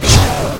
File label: Dark Slash effect